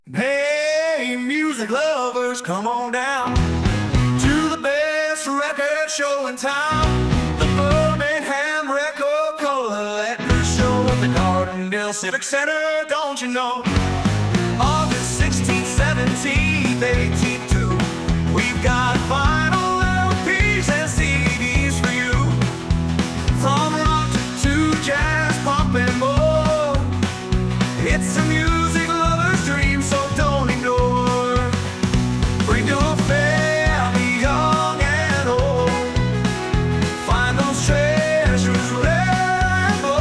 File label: Radio Jingle; record show advertisment